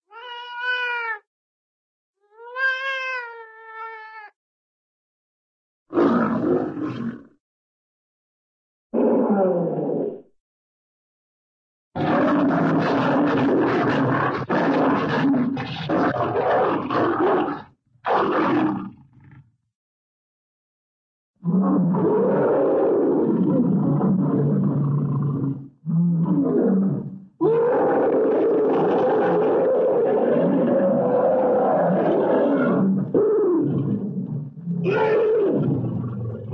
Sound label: lion lion cub cries, animal, cat; cartoon